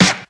hq treble heavy snare one shot f sharp key, Royality free snare drum tuned to the F# note